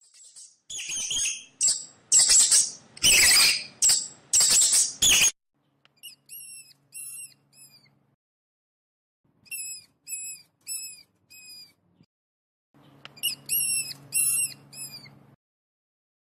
tiếng chuột kêu

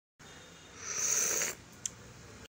tiếng uống trà nhấp môi

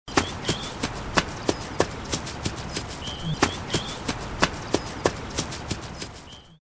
tiếng bước chân chạy trên cỏ

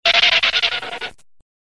am thanh truot hoat hinh de thuong

